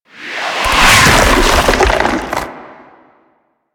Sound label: Sand Spawn; Warframe; Man In The Wall; Enemy Gameplay